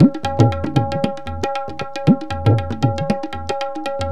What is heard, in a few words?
Rahiz, Percussion; Sonic Foundry (Sony Creative Software); World Pop